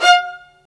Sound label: Vas3 Marcato